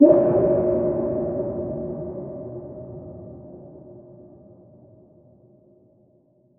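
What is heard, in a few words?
deep chunky reverb satisfying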